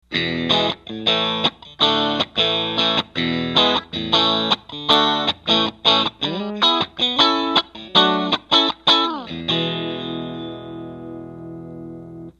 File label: cable